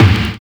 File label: BD, BASS DRUM, 70's Breakbeats